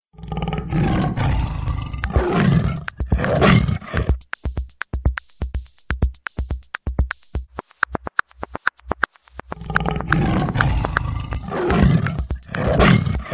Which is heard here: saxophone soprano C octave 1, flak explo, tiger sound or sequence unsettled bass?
tiger sound